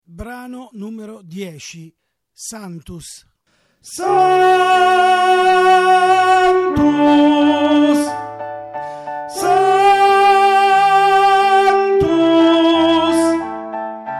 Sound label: sanctus ten; Requiem; Tenori